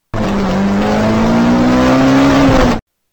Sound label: Vehicle